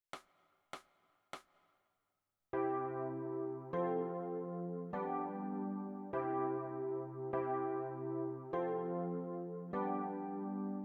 E Piano

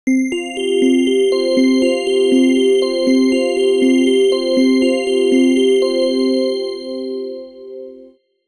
Synth; プロの音プロの技・令和版

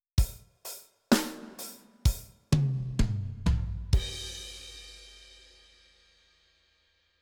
Drums Training; 耳コピが基礎からできるようになる本

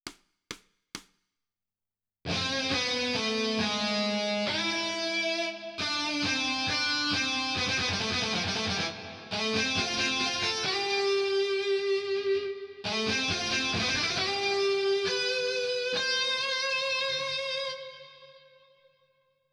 Melody

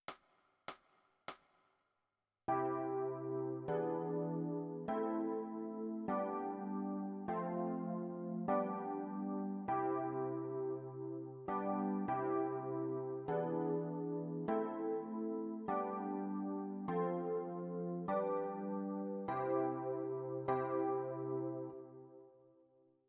E Piano

